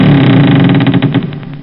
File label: creak door